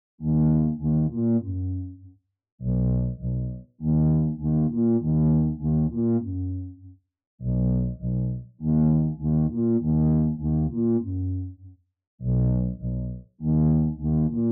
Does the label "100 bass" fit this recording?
Yes